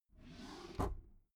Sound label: desk drawer open regular